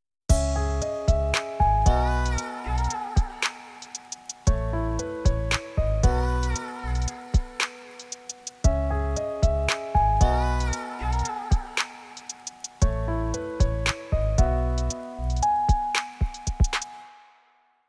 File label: drum off